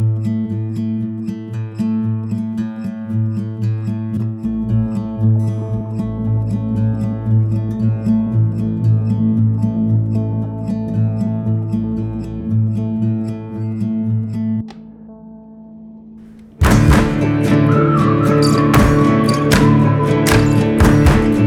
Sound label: Epic Whistle; Sounds Like An Earful Creative Commons Vol. 1